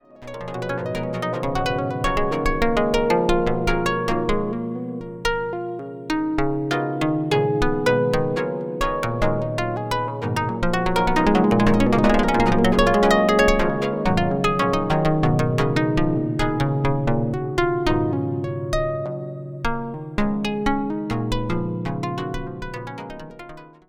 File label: variable tempo, 32 Voice String Synth Eurorack